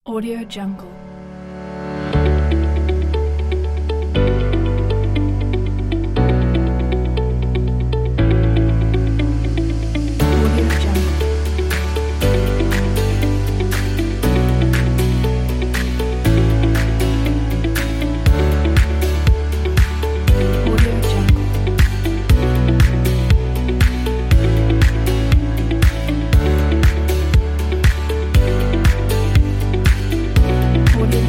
bright motivation

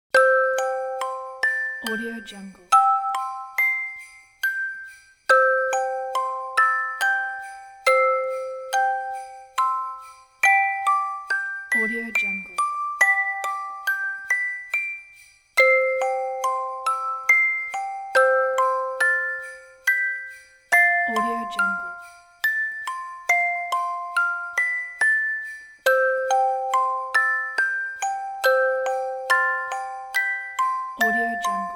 silent night music box

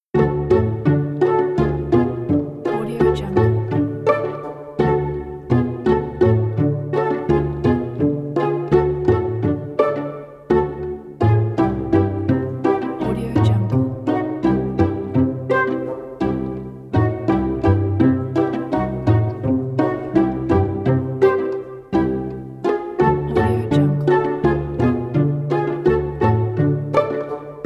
funny cinematic

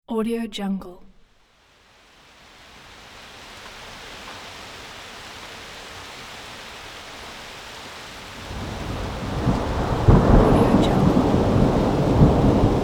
rain and thunder

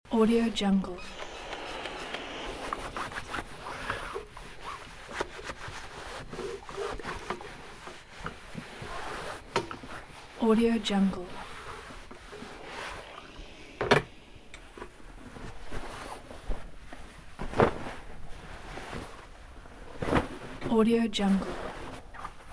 ironing trousers ambience loop

